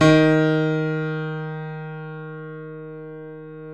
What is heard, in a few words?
Eb2, YC7 piano, mf; Roland L-CD701